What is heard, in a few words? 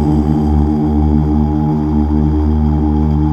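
Male Uuh; Best Service Gigapack 1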